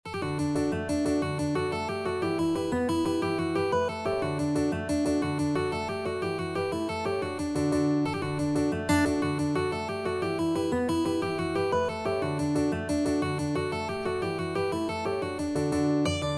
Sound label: irish jig medley, mandolin